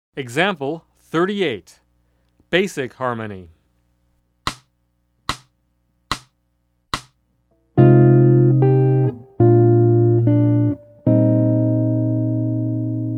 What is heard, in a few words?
Jazz; jue shi ji ta